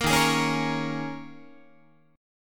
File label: Eb 7th Suspended 4th Eb, Guitar; Eb7sus4; strummed